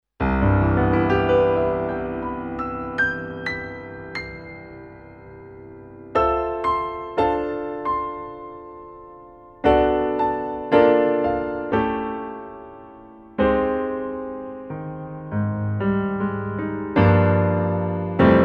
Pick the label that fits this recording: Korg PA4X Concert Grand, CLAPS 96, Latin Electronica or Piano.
Korg PA4X Concert Grand